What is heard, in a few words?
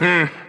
mmm